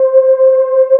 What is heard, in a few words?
DIGI WAVE, DIGI PAD; AKAI S6000 CD-ROM - Volume 1; SAMPLE WAVE MIXING 2